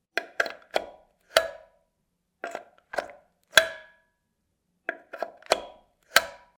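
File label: socket making sounds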